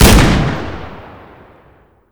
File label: 357 single